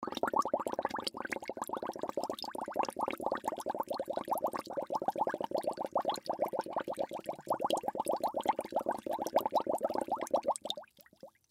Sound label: Water Straw Blow Bubbles Milk Cup; Miscellaneous Human Sound Effects; Blastwave